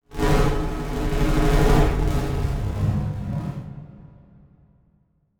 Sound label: Transition Delay Medium